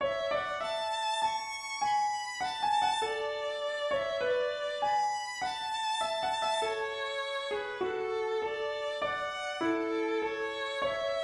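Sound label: waltz, melody and 5ths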